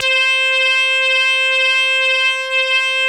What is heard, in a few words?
SQUEEK, LEADS; Masterbits; Vintage Voltage